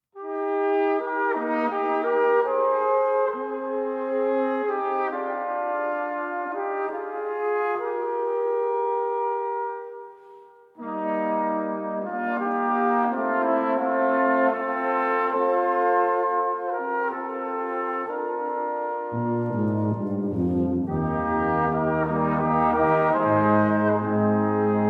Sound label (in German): Blaser